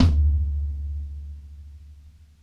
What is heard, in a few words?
low mid tom D key, Royality free tom drum one shot tuned to the D note. Loudest frequency: 136Hz